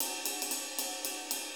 6-8 Ride, HiHat & Ride Loops

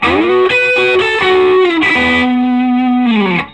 Vintage Blues Guitar, SOLO; USB Soundscan vol.22; AKAI; SOLO A125

